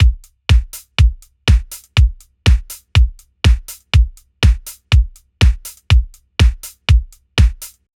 Disco Drums 8 1 Ratio 30ms Attack 1ms Release 5 dB GR Peak Stereo SC Filter 90Hz; VSC 3